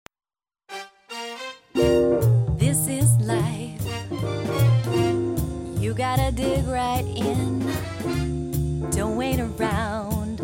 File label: Race Track Jingle